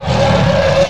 ape roar

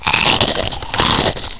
Biological Eating; Spaceward Ho!

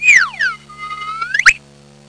HI SLIDE; Spanish Net; HALL

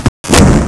Bck-Rchhhrchpchrc; Burning Rubber 1.2.3

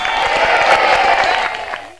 YAY; Softdisk for Windows 41; XOLTRAAN

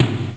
tom low

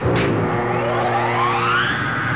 copter power; Army Men TiS

